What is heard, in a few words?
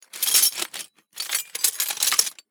Rummage Antique Cutlery; SoundBits; Antiques